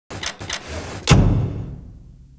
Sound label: Intro Animation